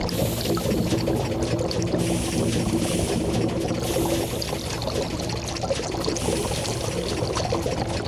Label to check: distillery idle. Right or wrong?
Right